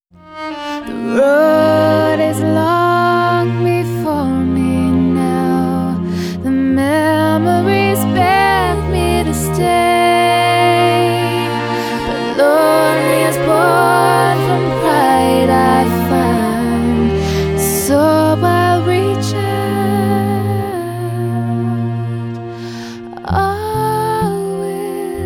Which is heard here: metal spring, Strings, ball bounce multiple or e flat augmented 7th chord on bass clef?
Strings